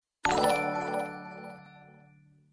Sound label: follower alert sound effect; twitch